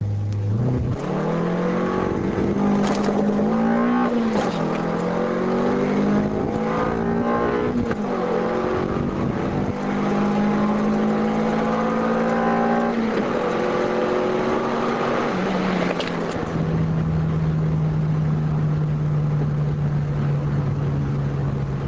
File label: drag